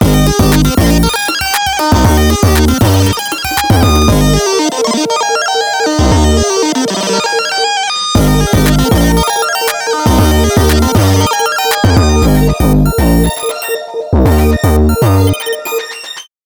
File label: drop pitched up